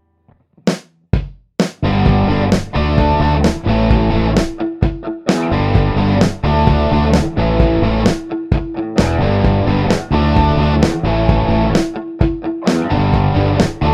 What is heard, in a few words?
rock